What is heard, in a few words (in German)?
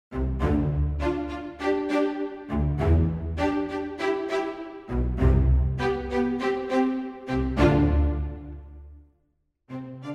Streicher Spicc; Albion One